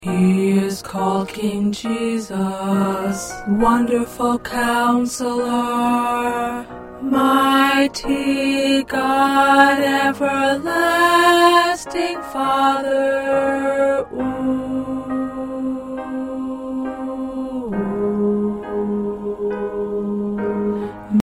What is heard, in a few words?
1st Tenor, choir; Mary Had A Baby